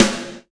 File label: SNR ROCK SN, snares; Roland L-CDX-01; Snares 3; Sn Modules 3